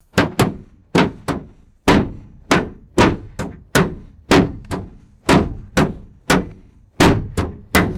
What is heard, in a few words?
hard plastic striking hollow metal